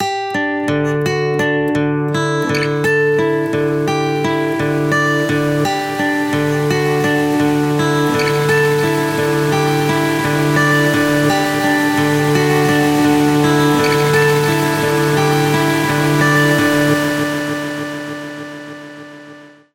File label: delay 4bit mode